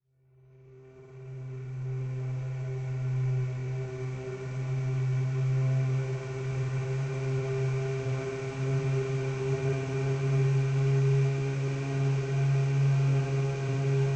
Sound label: Suspence Pad, Low Intensity